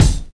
Bright Kick Drum D# Key, Royality free steel kick drum sound tuned to the D# note; kick drum samples